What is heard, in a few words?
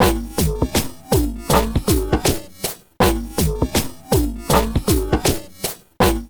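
drum loops crazy processed, 80 bpm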